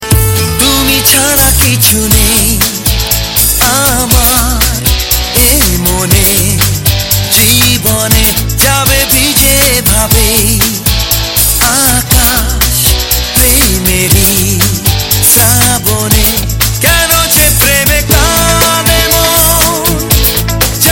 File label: bengali romantic ringtone